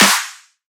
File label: Riddim Snare Clap